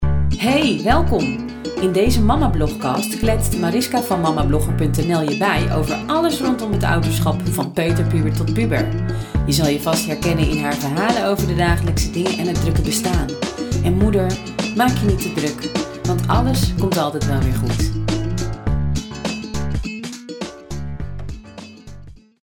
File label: podcast jingle; Mamablogcast; muziekfabriekonline